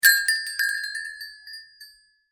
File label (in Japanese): ドアチャイム